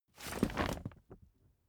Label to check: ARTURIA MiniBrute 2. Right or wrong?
Wrong